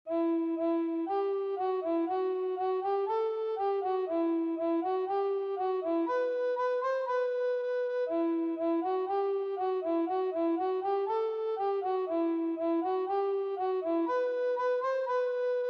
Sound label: Alto Recorder; Goddess